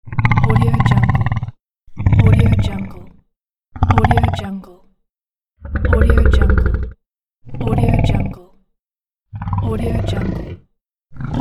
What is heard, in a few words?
monster growling